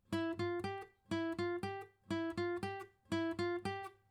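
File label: acoustic pattern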